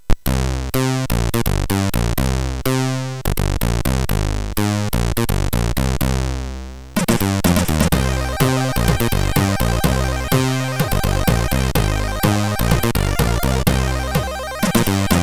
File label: sid MOS6581R3